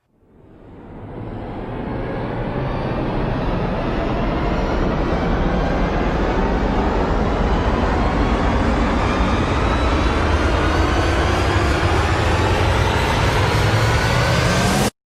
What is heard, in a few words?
Buildup